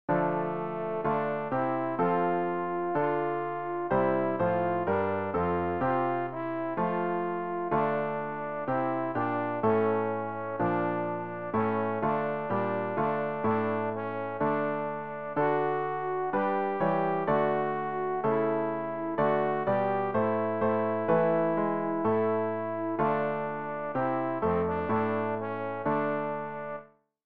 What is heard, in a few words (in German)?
alt, Übehilfen für das Erlernen von Liedern; ref gesangbuch; rg 320 dank sei dir vater fuer das ewge leben